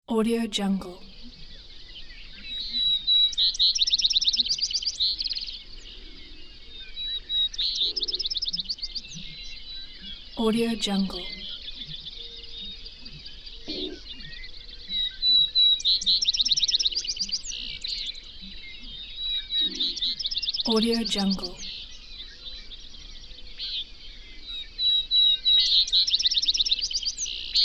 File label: nature sounds; alexleadsounds